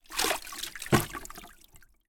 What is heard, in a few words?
household plastic mop bucket with water inside put down on ground